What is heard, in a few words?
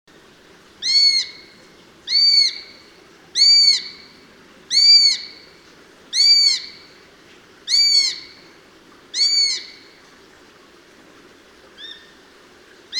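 perepelyatnik golos, sparrowhawk voice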